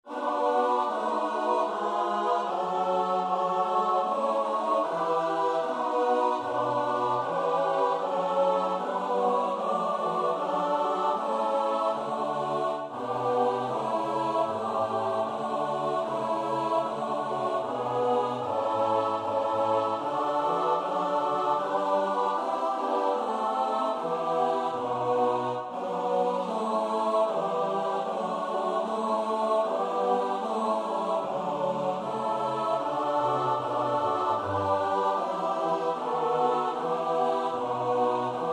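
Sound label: soprano alto tenor bass; Bach (Johann Sebastiaan); BWV 120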